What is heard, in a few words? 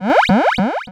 Cry